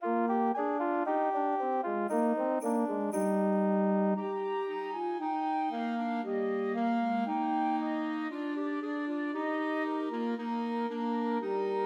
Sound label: Trios for Flute Clarinet and Alto Saxophone; Deck the Halls; Chrismas